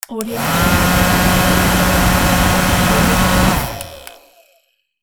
hair dryer

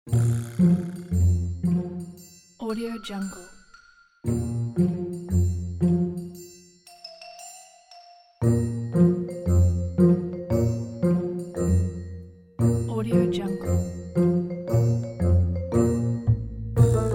magical comedy

